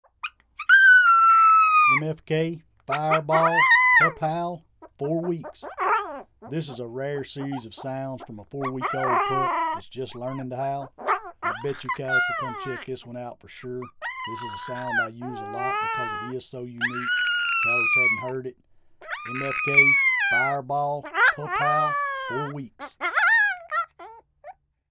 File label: Pup Howl 4wks, Game Calls; FireBall